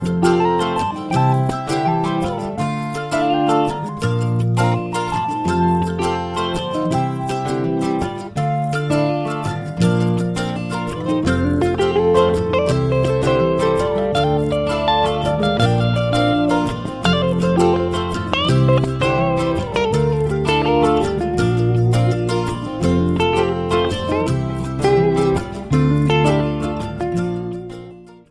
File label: Android Guitar